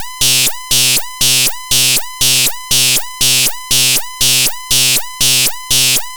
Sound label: Groovy Industriel; free techno acid samples